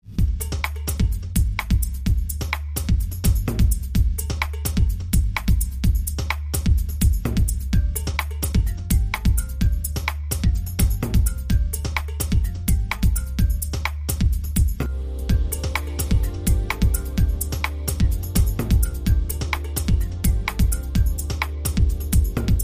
Nocturnal Groove

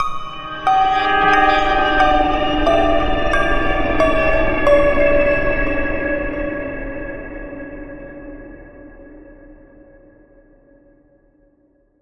Peculiar Piano Dream

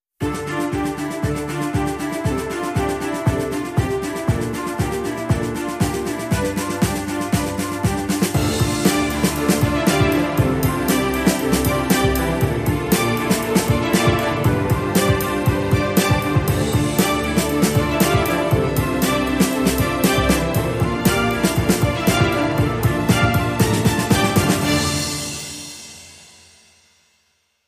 Bass

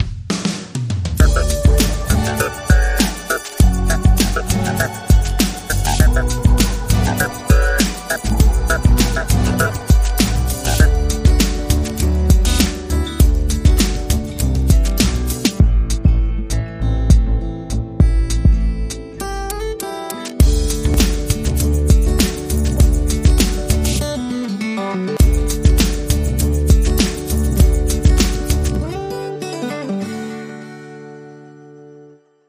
Acoustic

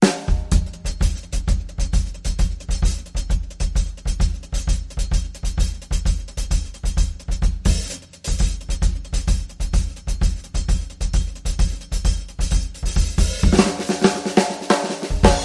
Fast Samba

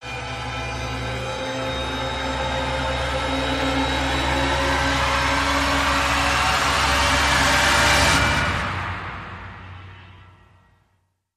Rise Short; Piling Up

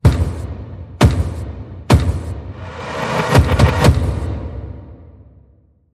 Loud Knocks

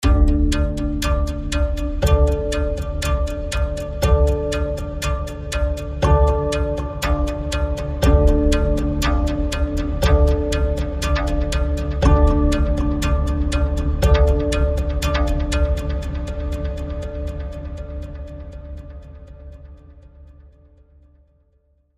Doom Pulse

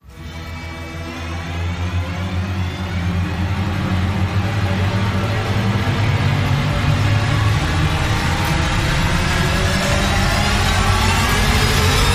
Slow Rise Anthem

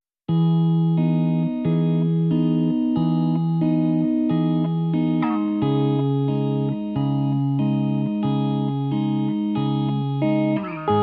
No Spooky Pads Mix

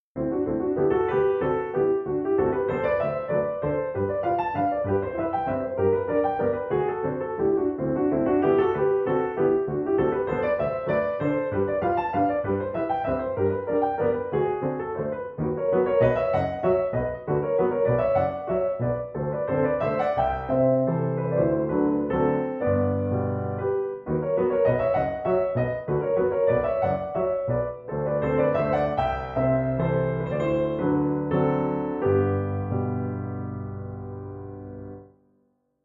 Waltz No 9 Op 39; Johannes Brahms (PD)

